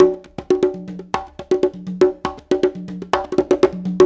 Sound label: Latin Congas, African Contemporary; Spectrasonics; Supreme Beats